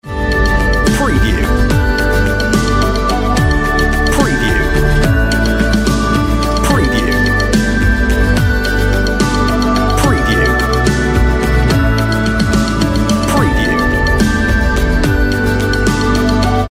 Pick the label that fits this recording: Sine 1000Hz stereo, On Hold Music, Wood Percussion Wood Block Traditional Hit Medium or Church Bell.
On Hold Music